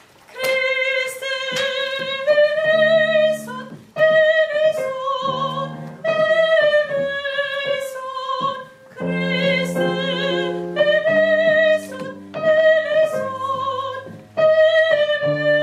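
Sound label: soprano; christe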